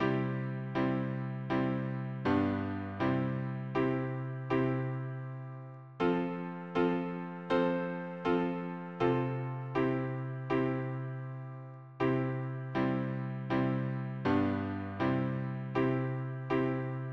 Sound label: harmony piano